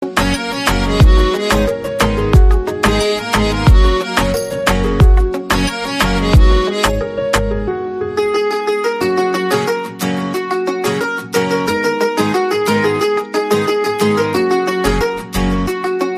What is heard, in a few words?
piano ring tone song